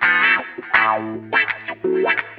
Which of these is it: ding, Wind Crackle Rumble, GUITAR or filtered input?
GUITAR